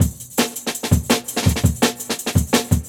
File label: Jungle Breaks